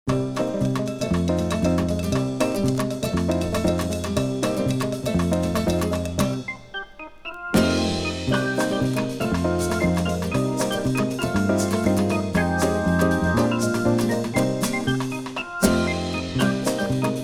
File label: batucada